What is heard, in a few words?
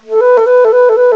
FLUTRILL; CMI Sound Library Rev 1.3; WIND